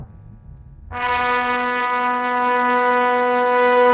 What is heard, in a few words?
funny